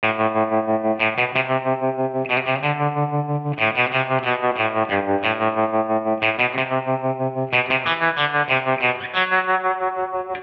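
092 Chill Pop Tremolo Guitar A minor, Chill Electric Guitar; Pop Rock; Pro Tools Essential Loops; Digidesign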